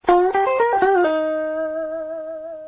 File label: lick